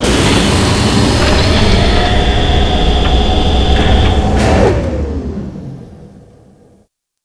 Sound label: gunraise; trek